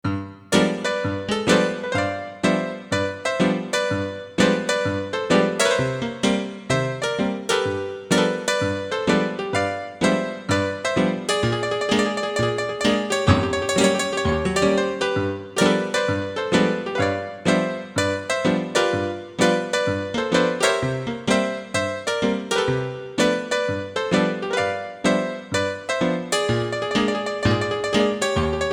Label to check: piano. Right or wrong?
Right